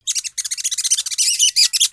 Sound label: Pet Rabbit Speak